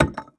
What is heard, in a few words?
IMPACT Concrete Slab on Concrete Slab Deep; mono; IMPACTS; Universal Sound FX